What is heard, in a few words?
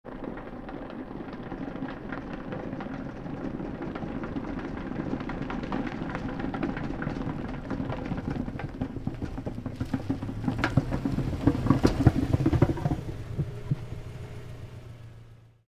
Car Tire Flat Fast